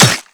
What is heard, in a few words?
Hunting Knife Hit Head; weapons